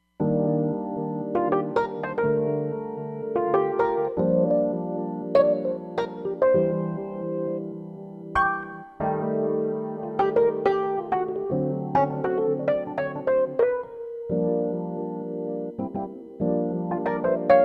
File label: Tre EP